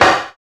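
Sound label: PER PROCU, Procussion, percussion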